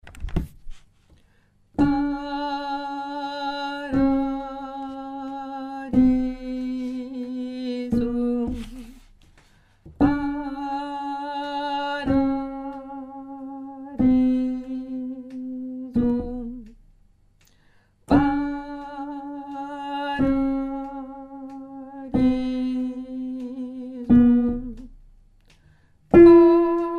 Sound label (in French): numero 4 lettre G alto